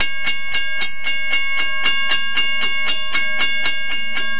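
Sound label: Railroad Crossing, Transport Trains; PFx Brick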